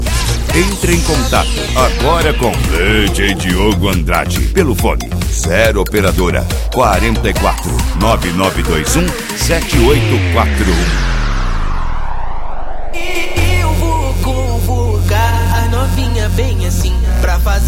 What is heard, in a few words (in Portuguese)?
eletro funk